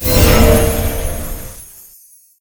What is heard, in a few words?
Skill Activate